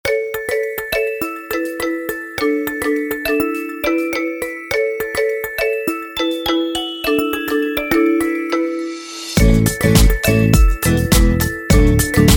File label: Huawei Shining Glitter, ringtone